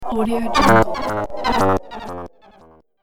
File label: glitch power loss